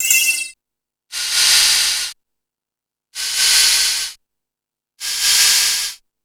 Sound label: House Machine, Drum Machines